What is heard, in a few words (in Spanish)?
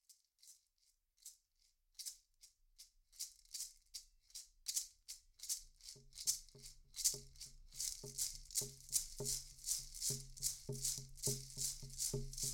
Maracas; colombia